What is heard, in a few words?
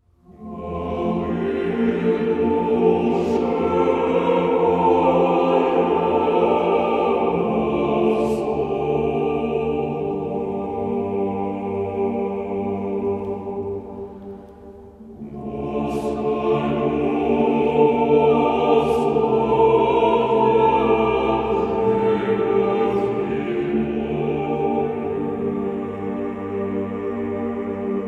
Choir Liturhia; Kyiv